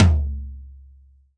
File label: drum, mixed drums, World Instruments